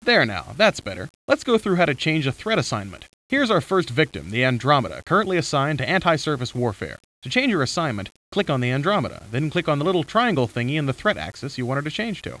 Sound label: HARP